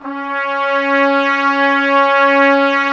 Woodwind Brass Ensemble; Miroslav Vitous

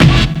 TURN; E-MU Formula 4000 Series Vol. 1 - Hip Hop Nation; Wicked Hits

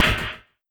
Oneshot

